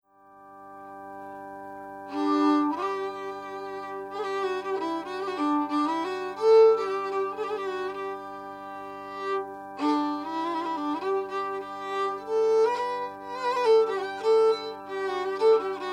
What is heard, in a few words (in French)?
mode franc impro